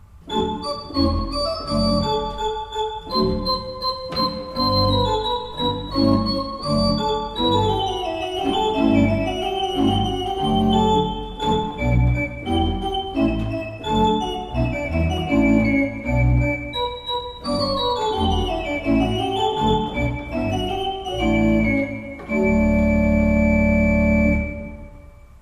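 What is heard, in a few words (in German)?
Rohlfing Kreienbrink 8 II P, pfeifenorgeln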